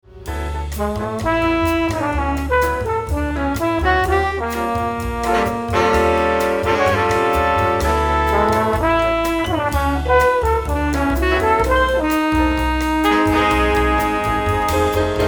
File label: trombone